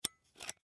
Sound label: Camera Close Lens; Digital Olympus Camedia C720; Cameras; Blastwave